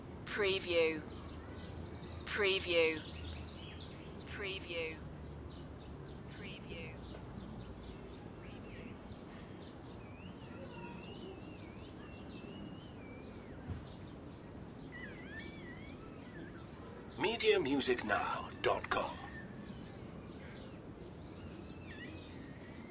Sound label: City Spring Birds